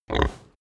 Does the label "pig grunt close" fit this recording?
Yes